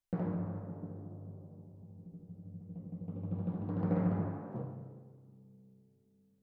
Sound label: Timp Main